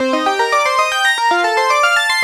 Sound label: ring